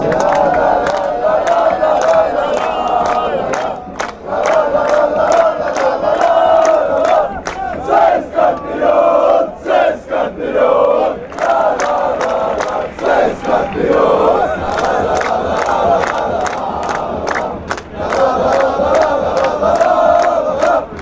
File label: cska chant